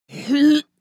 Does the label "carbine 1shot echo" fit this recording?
No